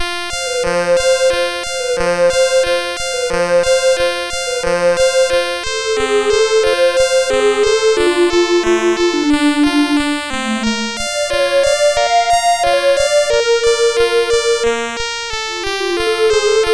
Pan Flute, MOS6581R4; Pan Flute Vol2 My Song; Bill Bastian

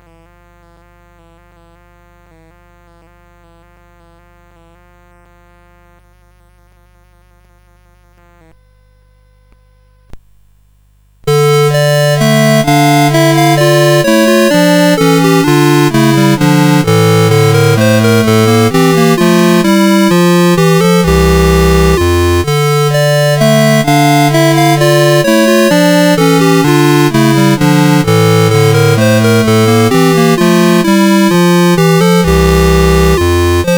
Sarabande; CSG8580R5

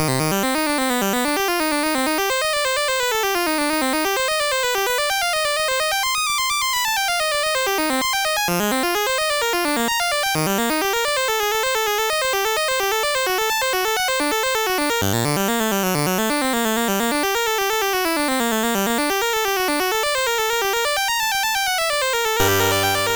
Solfeggio in C Minor, MOS6581R3

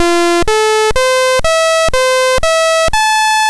BASIC sid MOS6581R4; Balloonrace

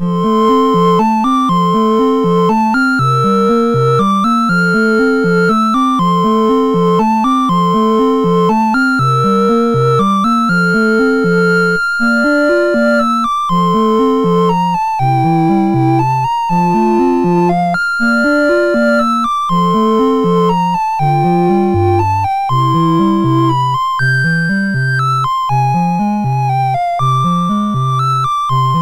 MOS6581; MOS6581R4

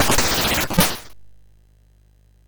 sid MOS6581R2; California Games

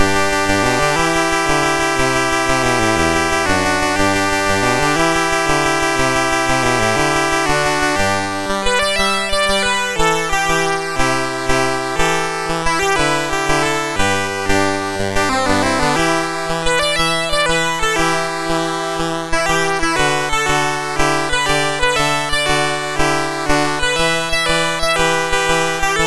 Gonna Laugh, MOS6581R4

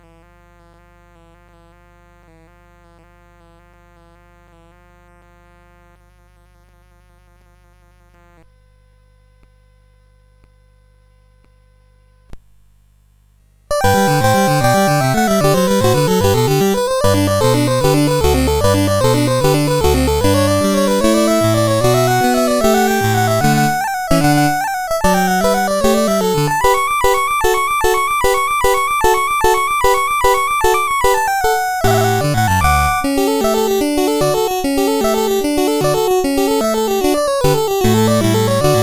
Allegro Vivace in G Major, CSG8580R5

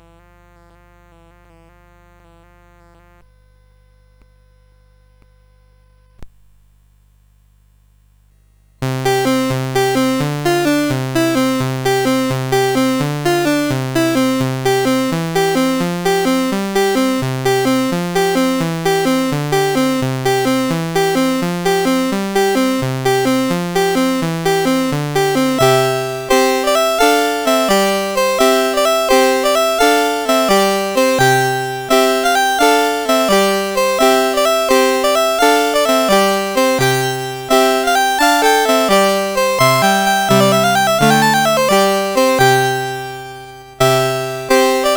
CSG8580; Two Canaries; CSG8580R5

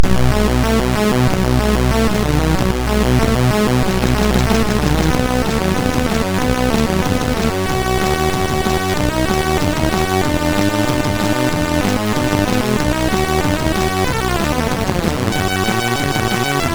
sid MOS6581R2

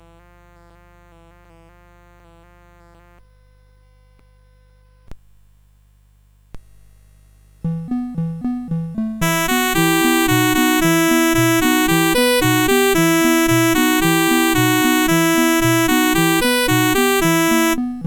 Streets on Cairo, CSG8580R5

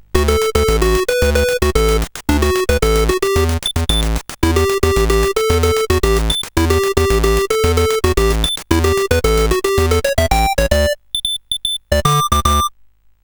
sid, CSG8580R5; Coco-Notes

